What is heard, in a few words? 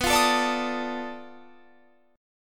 B 7th Sharp 9th Flat 5th, down